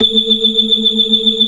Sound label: BLOOD OCT R, ORGAN; Keith Emerson Signature Series